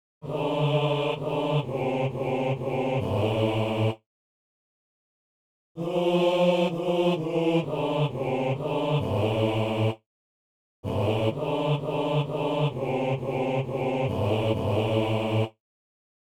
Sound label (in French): basse